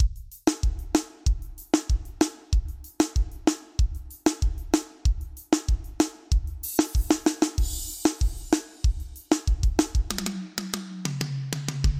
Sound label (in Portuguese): Vanerão